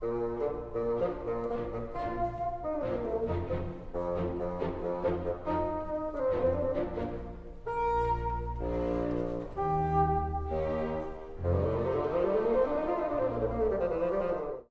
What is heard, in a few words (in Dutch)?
fagot; weber